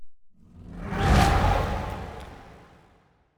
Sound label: aquatics dragon attack mouth